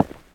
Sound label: Footstep Rock